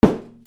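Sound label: percussion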